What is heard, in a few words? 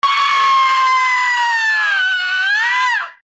scream long; Voice